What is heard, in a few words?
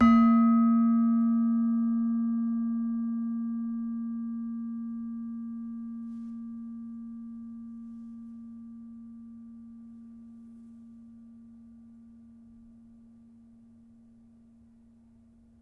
Demung soft, gamelan; gravityharps; timbrebox